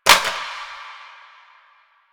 Plastic Drop; Warframe; NineteenNinetyNine

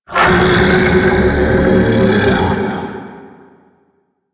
Chain Reaction Beam Vocal; Warframe; Warframes; Qorvex

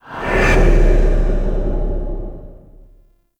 Angel Mist Despawn; Missions; Zariman; Zariman Assassinate

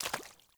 Walk Water, Footsteps; Enemy; Mockeries; Mockery

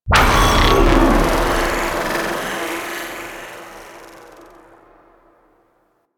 Personnel Security Cast; DE; Warframe; Weapons; Archwing; Powers; Archwing Healer

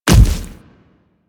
Splitter Step Default, Footsteps

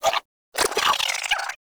Squid Plant Attack Vocal; Objects; Traps

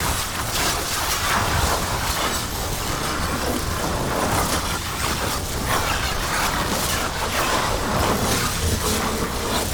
Projectile Loop; Weapons; Warframe; Tenno; Grimoire

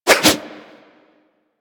Axe Small Spin Swing; Warframe; Weapons

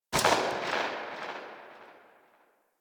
Pistol Tail; Weapons; Warframe; Tenno; Guandao

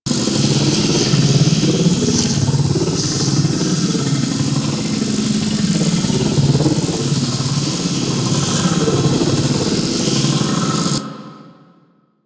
Orphix Shield Pre Disable Loop; Warframe; The New War; Sentient Devourer

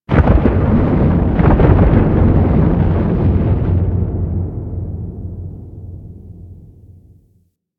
Earth Crack Large; Warframe; Ambience; Orokin Moon

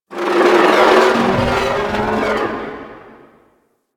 Ship Metallic Data; Warframe; Corpus Ship Remaster

